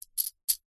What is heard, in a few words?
foley money coins small x4 set down into hand stack; zapsplat